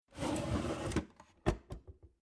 Household Drawer Old Wooden Cabinet Drawer Small Slide In Creaky; Drawer Sound Effects